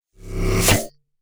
Science Fiction Magnet Attract Metal Impact Super Power; Electrical; SFX Bible